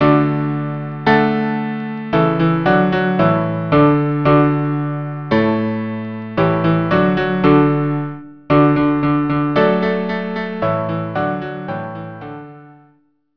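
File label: cello piano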